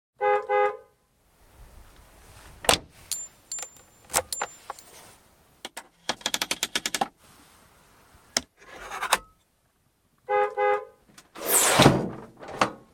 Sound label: sounds of 504, peugeot